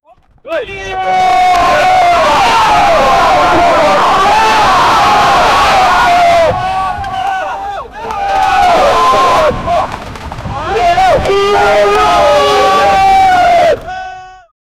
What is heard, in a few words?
a massive horse army clas, battle cries